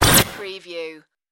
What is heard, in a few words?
robot malfunction burst